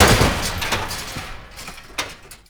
Destructible Metal Barrel; Warframe; Ambience; NineteenNinetyNine

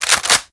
Pump, Shotgun; Warframe; Weapons; Strun

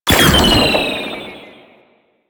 Mutalist Proj Fire; Warframe; Proto Infested

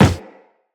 Run Wood, footsteps; Operator

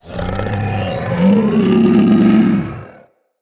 Infested Femur Vocal Charge

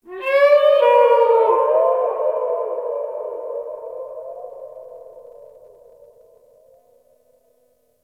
Ocean Underwater Creatures Medium; Warframe; Grineer Ocean

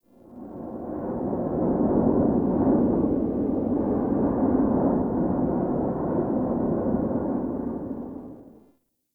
Forest Half Open Cave Wind; Warframe; Grineer Forest

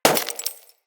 Bullet Impact Debris Glass; Weapons

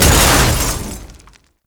Loot Crate Open; Narmer; Warframe; Storage Container

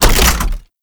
Hack Minigame Mech Action; Warframe; Duviri

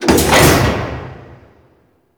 Galleon Large Door Close; Grineer

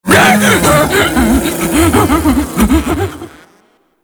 Pre Death, Vocals, Enemies; Warframe; Proto Infested; Ape Prelate

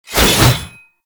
Void Heavy Scythe Charge Whoosh; Warframe; Duviri; Incarnon Adapters; Melee

